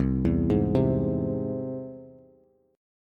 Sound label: C Augmented, Bass, chord; arpeggiated; 8,7,6,5; JGuitar